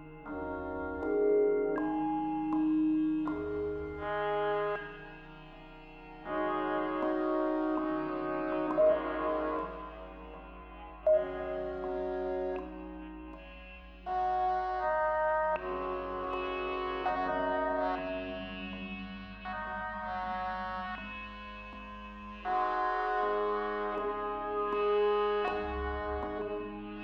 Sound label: arpeggio; 0°N 0°W